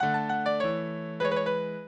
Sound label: minuet, mozart